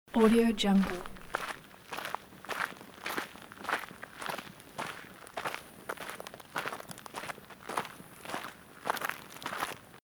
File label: marching on gravel loop